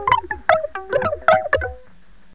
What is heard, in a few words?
Hidden Melody